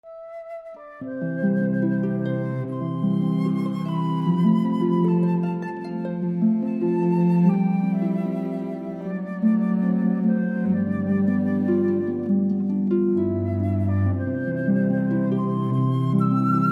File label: harp flute cello